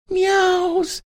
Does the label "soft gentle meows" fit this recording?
Yes